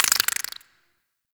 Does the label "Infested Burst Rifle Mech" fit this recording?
Yes